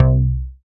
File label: BAS MKS-50, Roland